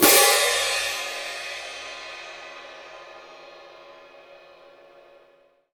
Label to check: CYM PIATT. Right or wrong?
Right